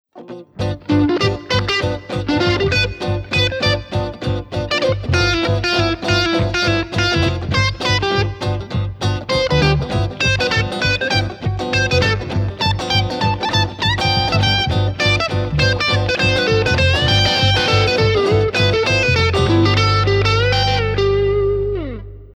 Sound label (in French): Mode V Mixolydien